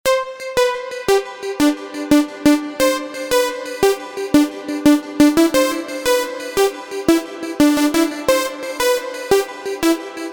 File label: pluck; wings